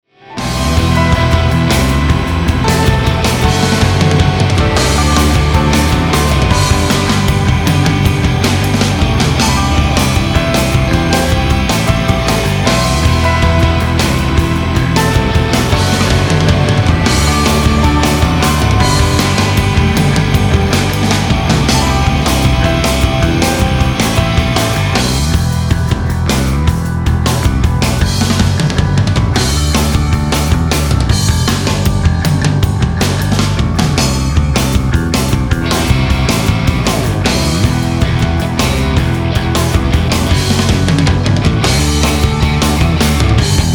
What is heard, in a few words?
bell no vocal ringtone